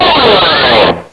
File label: slide(pick)